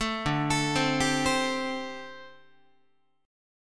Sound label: D 7th Suspended 4th, down, Guitar; D7sus4; arpeggiated; 12,10,12,10,11,11